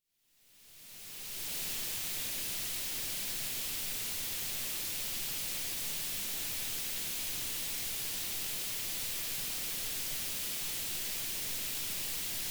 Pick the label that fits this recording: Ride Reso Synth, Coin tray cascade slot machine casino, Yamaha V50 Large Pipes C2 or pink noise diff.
pink noise diff